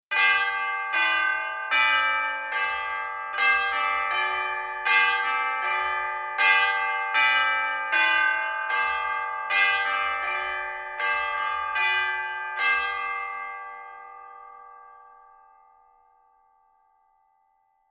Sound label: Melodic church bells sunday ceremonial